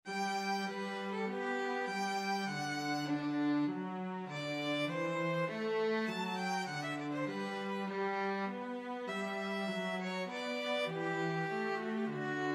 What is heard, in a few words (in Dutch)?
S-A-T-B, koorpartij oefening; Caldara (Antonio); Missa dolorosa